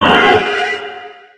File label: attack hit, poltergeist; monsters